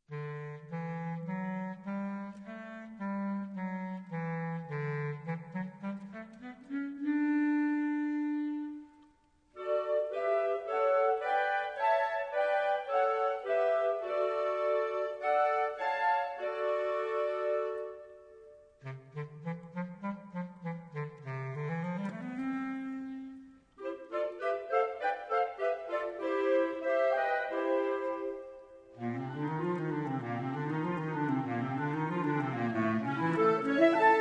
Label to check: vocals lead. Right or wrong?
Wrong